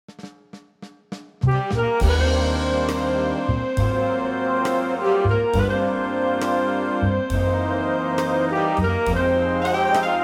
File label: big Band Eb